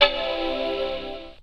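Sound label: Mac Start Up Sound, computers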